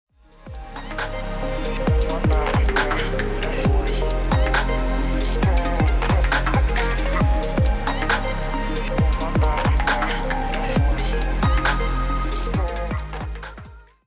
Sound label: pan